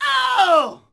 Axmn Die Vox; Civ4 beyond sword

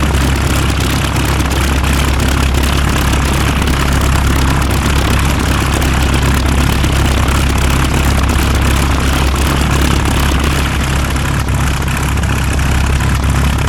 Aircrafts Engines DB605; DCS

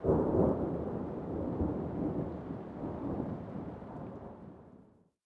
thunder light; Rust

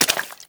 foot mud, footsteps

